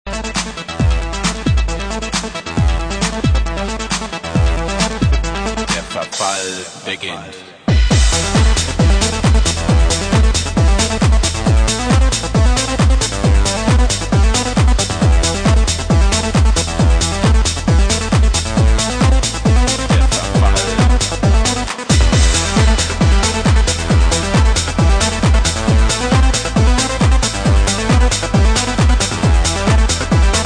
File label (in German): TECHNO